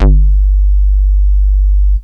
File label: phat bass; Giga Samples Collection; fet cx5